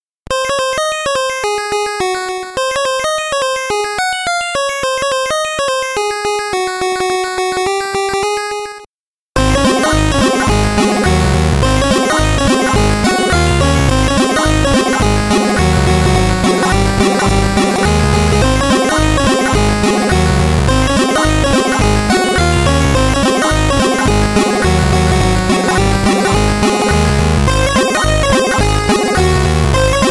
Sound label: noise song slap-ish wave bass noise; circuit bent gameboy noise; nesugoshino noise song 2; weeklybeats-2018